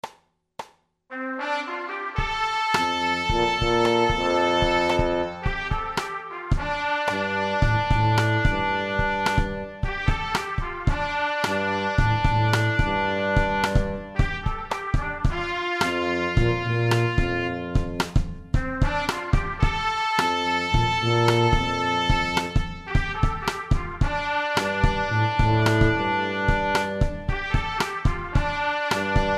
blues tuba

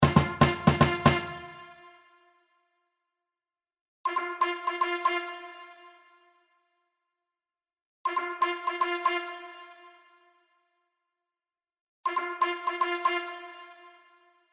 tp2 funky

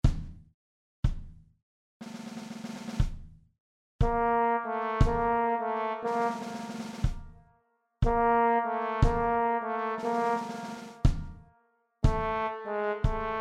betterie trombone1

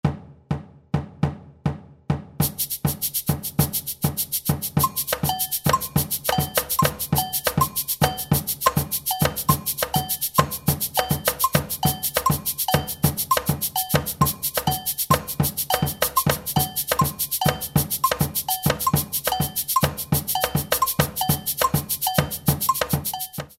batucada

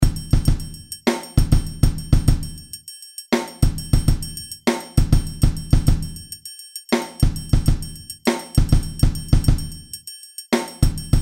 percussions

